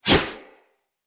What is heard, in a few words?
Sword Swipe, Whooshes; Weapons; Melee